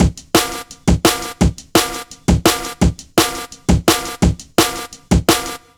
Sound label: LOOP, Jungle